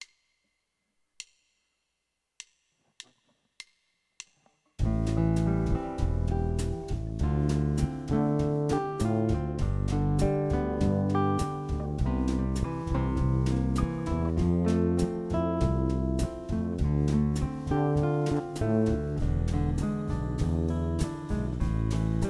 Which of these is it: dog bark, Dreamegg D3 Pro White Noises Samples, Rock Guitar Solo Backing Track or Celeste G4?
Rock Guitar Solo Backing Track